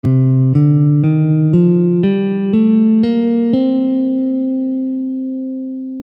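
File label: Dorian C, Gammes & Modes · Guitar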